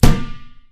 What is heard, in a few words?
rubber tire impact hard